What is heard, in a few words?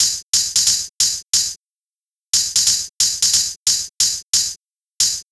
Hi Hat